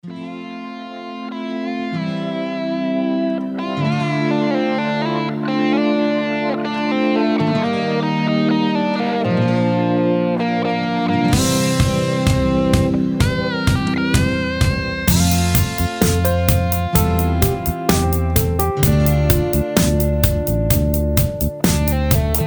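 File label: Instrumental Melody Low Solo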